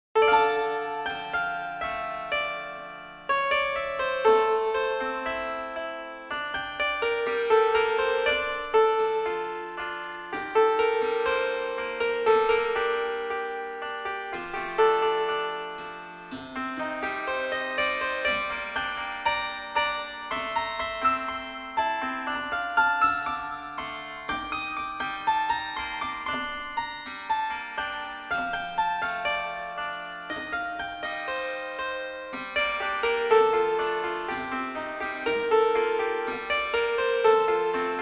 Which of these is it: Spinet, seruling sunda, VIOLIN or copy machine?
Spinet